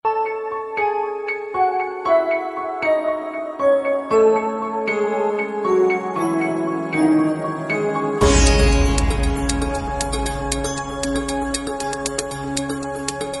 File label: love sitar, ringtone